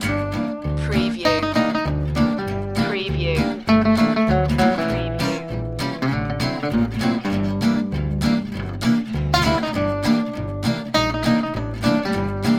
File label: parisienne chic loop